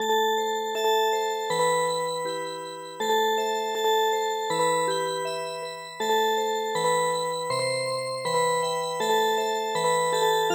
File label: Music Box, midi; Sakura